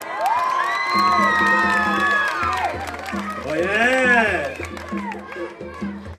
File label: Applause Oye